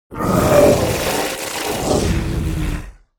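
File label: Corroded Throat, Dark Creature Sounds; Mono; Audiomeals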